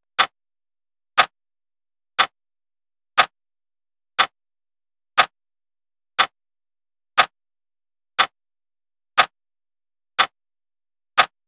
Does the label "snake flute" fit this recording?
No